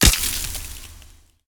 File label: ice prick